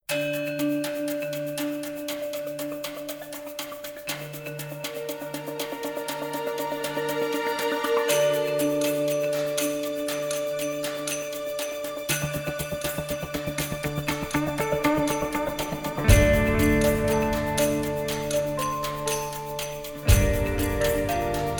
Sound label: Suspense